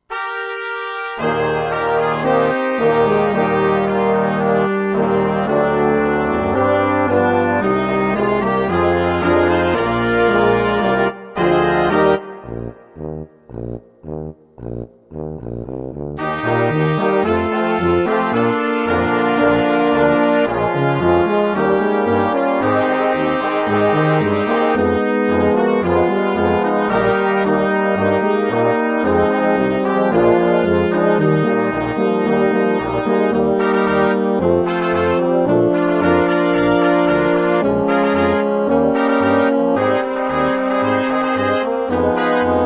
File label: march